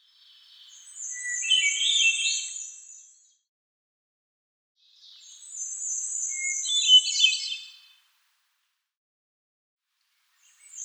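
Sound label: Hermit Thrush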